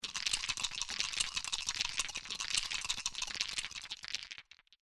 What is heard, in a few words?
dice Rumbling; Sicbo